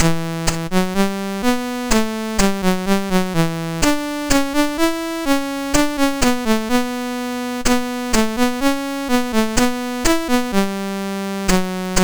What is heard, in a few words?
Scroll, MOS6581R4; SETI; hvsc